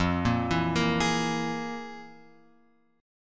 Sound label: F Minor Major 7th Double Flat 5th; FmM7bb5 Chord {1 1 2 3 X 4} arpeggiated